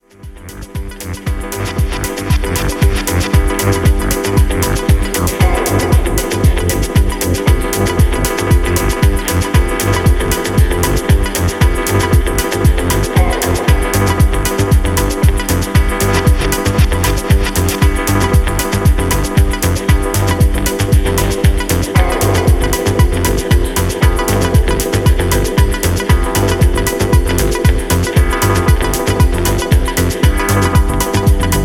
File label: jazz